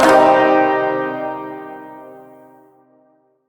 Shawzin Blues Chord A; Chords; Warframe

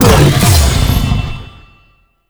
leap cast; Warframe; Voruna

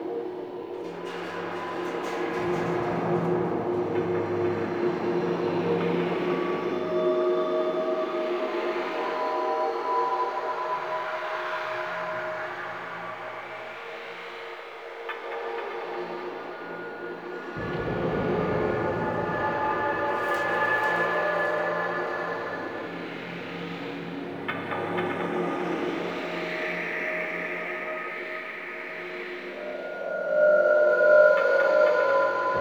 Hub Bed Loop, Ambience Lab; Warframe; Entrati; Inf Hub Lab

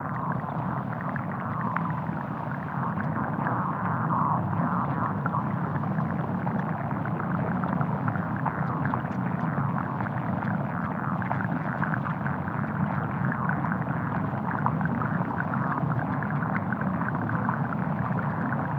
Vial Hazard Poison Loop; Warframe; Entrati; Hazards

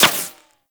Laser Impact Default; Warframe; Weapons; Bullet Impacts

